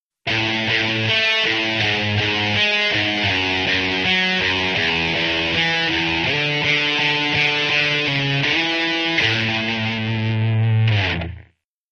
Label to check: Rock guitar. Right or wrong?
Right